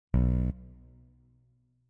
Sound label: Town Intro Bass; backpack hero gamerip 2022